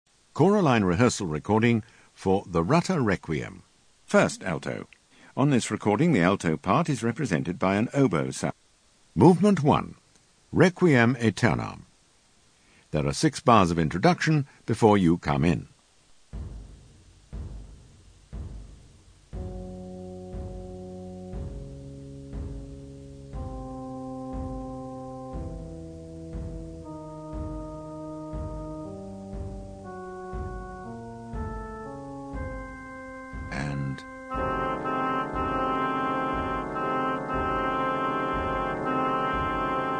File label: Alto